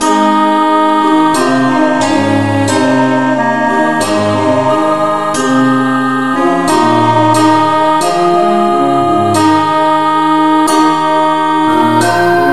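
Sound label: no vocal, key A flat; christe Sanctorum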